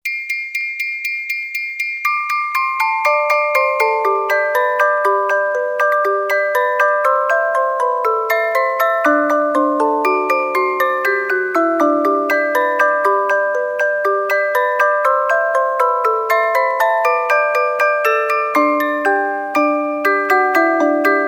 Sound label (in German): Jingle Bells, Spieluhren, Weihnachten